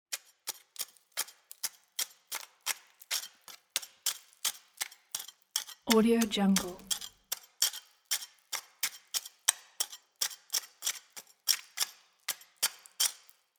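small spatula removing paint and plaster from interior; domestic sounds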